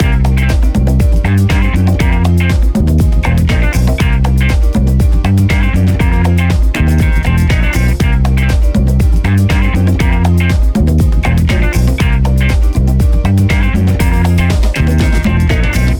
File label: Funky Fashion Loop